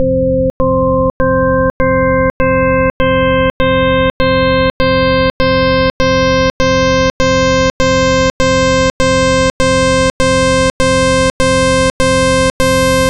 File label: 30 harmonies 50 bass each, synth